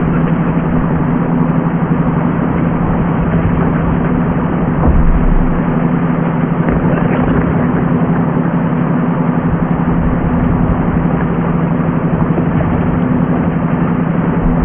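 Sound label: Ambient Stalingrad Boatride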